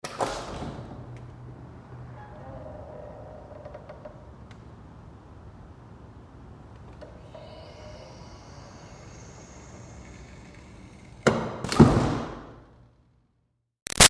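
Door Metal Commercial Push interior Med open-close; Doors; BigRoomSound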